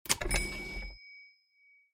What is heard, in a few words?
cash register